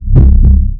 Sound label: heartbeat, player; quakemapping